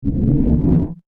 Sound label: Rumble Bass Whoosh